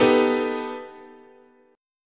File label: B Augmented 7th, chord